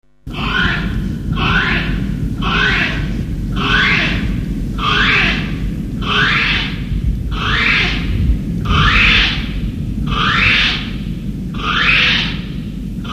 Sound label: Northland brown kiwi call female